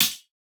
Hihat, Dubstep; Ghosthack

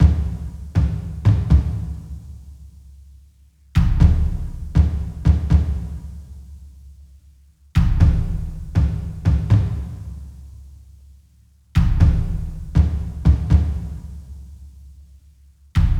Drums - Fm 60Bpm; Construction Kits; STEMS; Ghosthack; SkyRealm; Kit 04 - High Rock View

